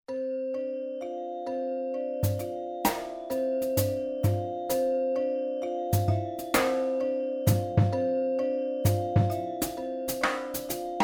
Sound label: smooth jazz